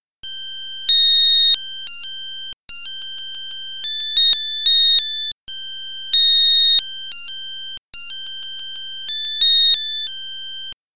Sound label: eieruhr zum mitkochen; Pop Art PiepEi; Küchentechnik